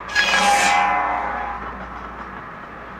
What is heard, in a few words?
Sawmill Blade Off